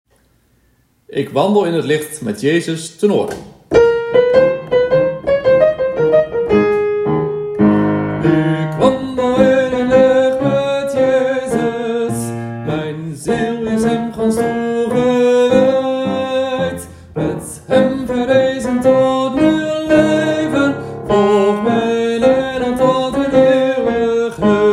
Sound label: Tenor, Ik wandel in het licht met Jezus